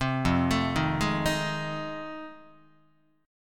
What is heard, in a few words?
F 7th Sharp 9th Guitar, chord; arpeggiated; F7#9; JGuitar